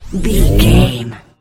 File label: sci-fi power up object